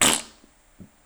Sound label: shoot